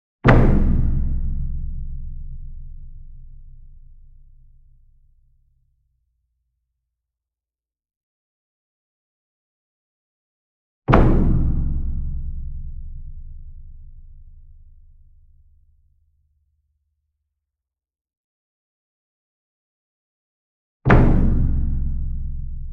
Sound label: SINGLE DRUM Gong Flam Soft Beater Medium; boom library; Cinematic Strikes Construction Kit